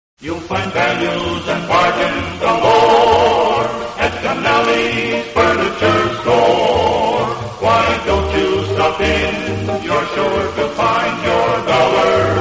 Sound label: radio jingles; kenally furniture; allied radio artists